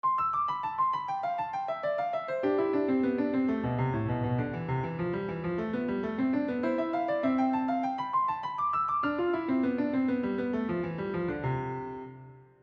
Klavierstuck abbozzo

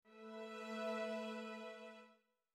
Der Secundquintenaccord

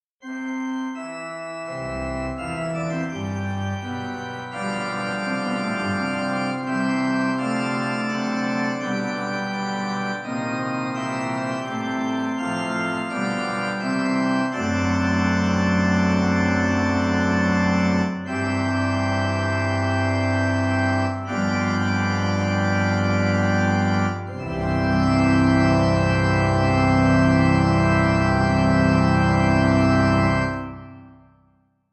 Praeludium fur Orgel C Dur; 87 Kleine Praeludien von Paul Honneger

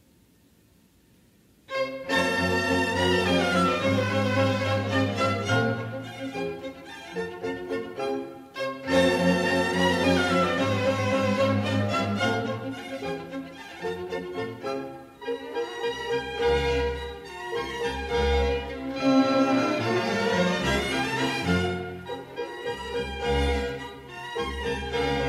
Deutsche Tänze für orchester; Tanz 03

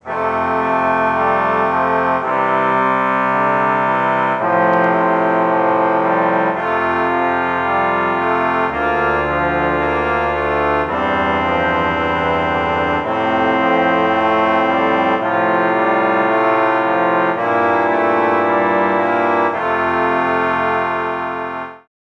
Stimmiger Contrapunkt; Ronge; corretto

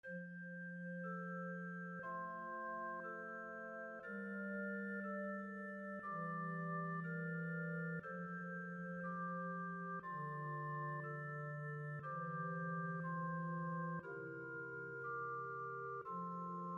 Dreistimmig Zweite Gattung

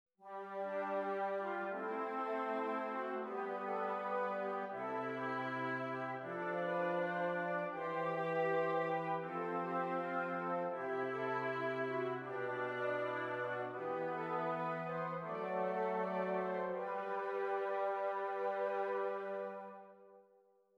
III Stimmiger Contrapunkt; Ronge

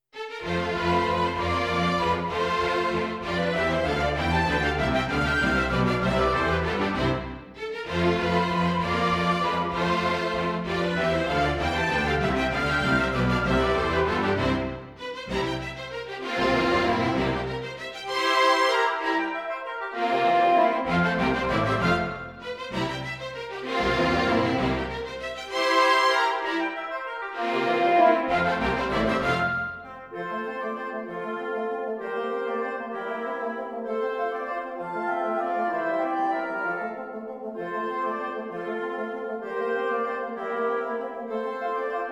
Deutsche Tänze fassung für orchester; von Albert Willem Holsbergen; Tanz 5

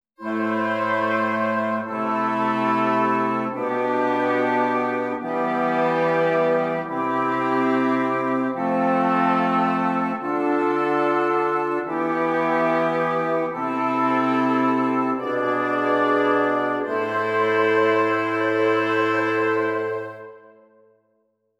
Stimmiger Contrapunkt; Ronge

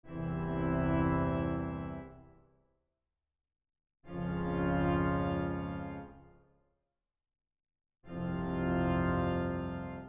Quarten

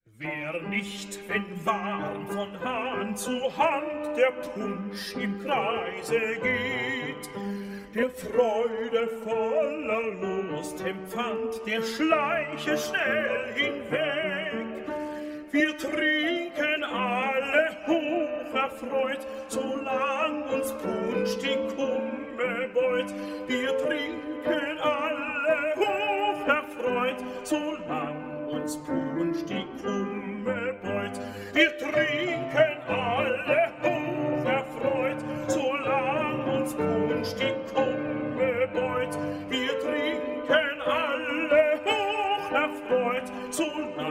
Lied fur ein singstimme mit Begleitung von Klavier; WoO 111 Punschlied; Textdichter unbekannt; Feurig

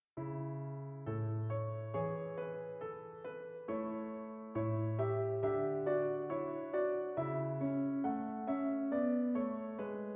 Bagatellen fur Klavier Andante cantabile

